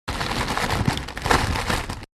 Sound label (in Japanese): gasagoso